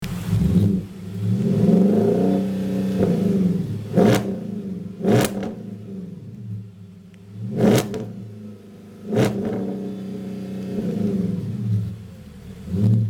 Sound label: Audi A8 4.2